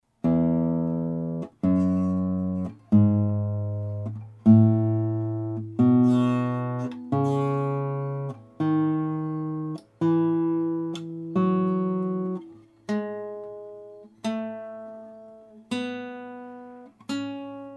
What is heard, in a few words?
guitar; stille